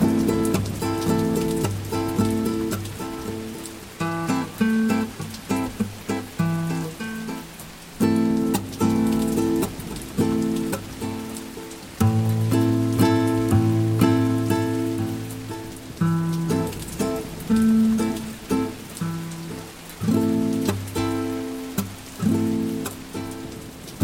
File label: nylon; iranize